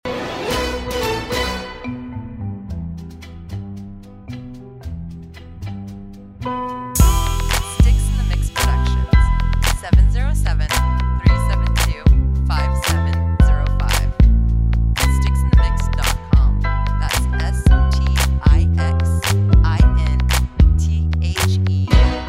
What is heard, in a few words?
piano samp